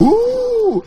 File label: "ooh" male